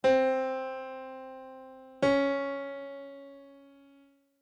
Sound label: music intervals minor second